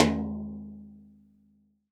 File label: TD-20 Tom B, Roland TD-20 Toms